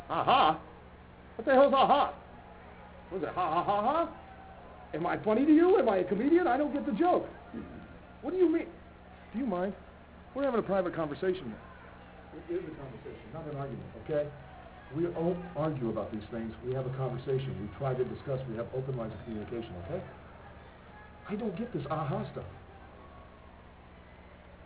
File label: haha